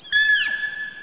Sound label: cow elk